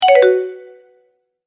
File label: Multimedia Tone-Return/Back